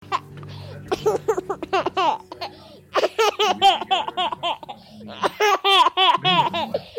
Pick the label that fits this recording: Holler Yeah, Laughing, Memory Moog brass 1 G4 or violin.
Laughing